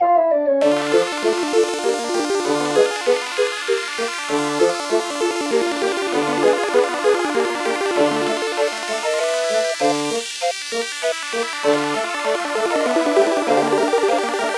Fiery Finale (Synth); Super Mario 3D World; Wii U

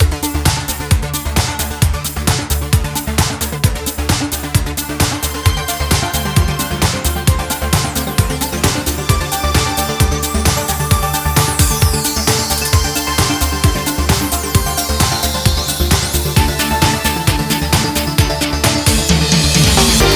BGM MENU; medarot classics plus switch gamerip 2020

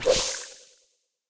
Wall jump; super mombo quest

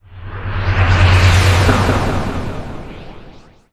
door; Shin Megami Tensei IV Apocalypse; 3DS

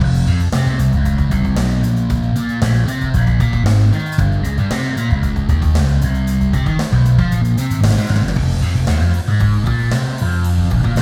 Tutorial Music; Get in the Car, Loser

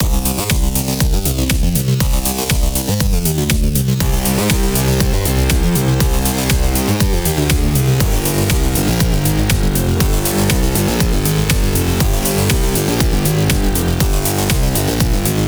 Boss Clubmix; Pixel Sand

